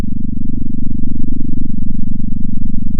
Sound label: pop, sound of a small motorcycle